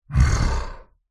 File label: horror monster soft growl breathy